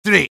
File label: medic taunt exert; rps